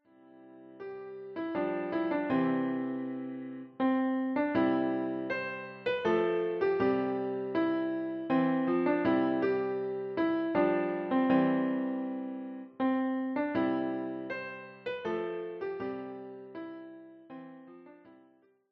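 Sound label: The Last Rose of Summer, piano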